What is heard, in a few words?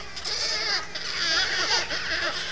wood stork